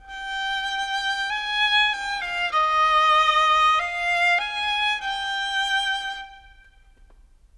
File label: Violin; Sonic Foundry (Sony Creative Software) - Orchestral VOL-2; Modern 36